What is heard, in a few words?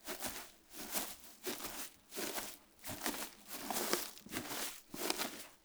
Footstep Fullstep Hay Walking Plimsole